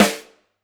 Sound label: Lud Rim, drum